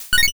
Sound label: line clear